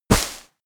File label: step walk grass; schritte